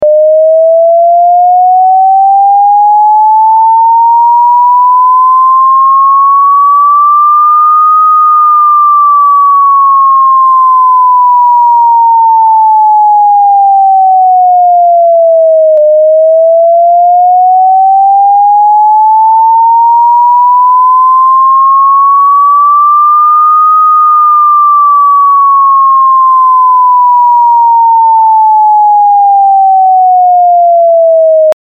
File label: Siren